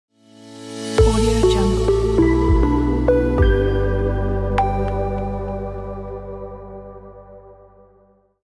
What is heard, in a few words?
minimal logo